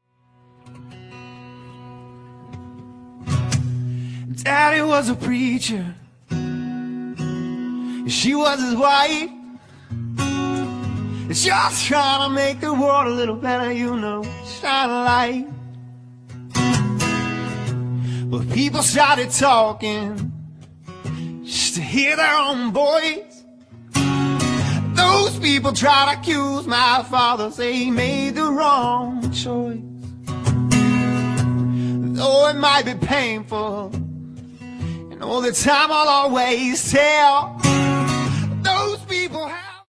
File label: acoustic